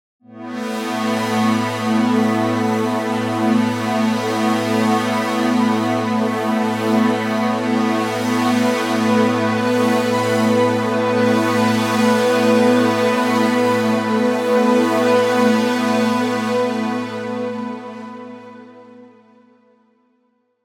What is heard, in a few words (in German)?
Poly Pad mit FX; Cherry Audio PS-3300